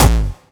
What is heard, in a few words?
Kick